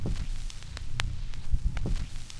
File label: CRACK; E-Lab; X Static Goldmine 2; akai